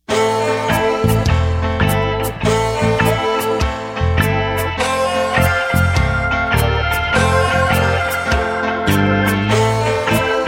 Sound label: reggae blues